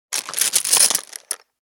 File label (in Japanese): 点棒を取り出す; 場面切り替えや心理描写; 演出効果音